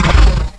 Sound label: hide dash, zombie; Zombie Darkness; hidden